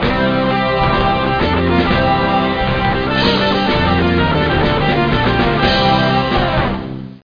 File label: NBC MUSIC; The Official NFL Yearbook 1995 to 1996